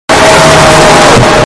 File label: jumpscare; o retorno de bonnie